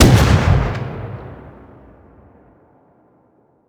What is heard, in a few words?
double shotgun attack; Rust